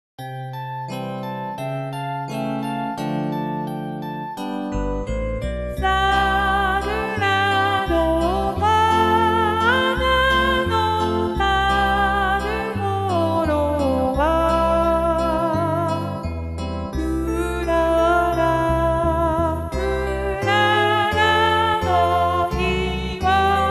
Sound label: alt, chor